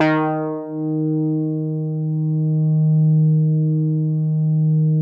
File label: MEMORYMOOG; Big Fish Audio - First Call Vintage Keyboards